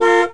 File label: 91 Firebird Horn; Automobiles